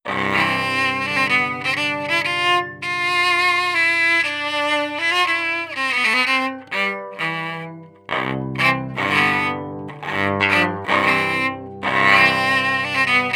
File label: electric cello classic DI sequence